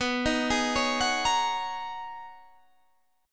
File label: G sharp Minor 11th B, guitar chord